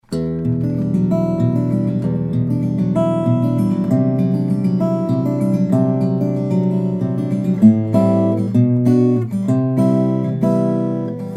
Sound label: parlor, steel string guitars, flattop; New Hippner Parlor Brazilian/Engelmann; Darren Hippner